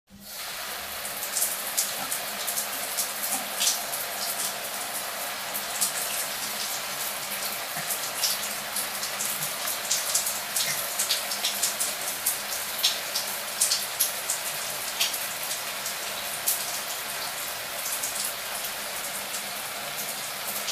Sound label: Shower Water W Heavy, splashes